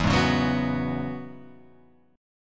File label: E 6th Add 9th E, Guitar Open F; strummed; chord; 4,3,1,1,2,1